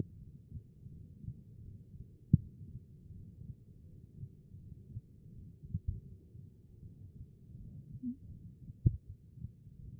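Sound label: HEART, heart sound; B MODE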